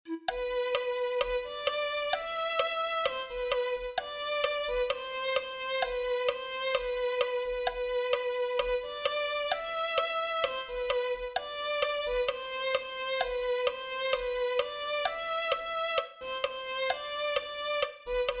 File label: violin